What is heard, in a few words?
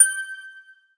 Pickup Gem Bells; Pickups